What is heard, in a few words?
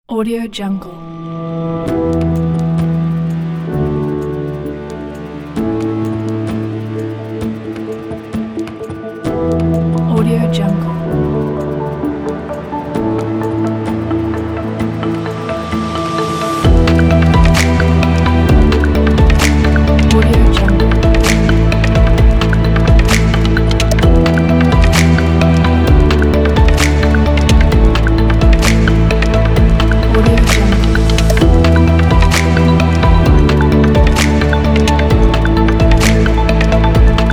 abstract ambient background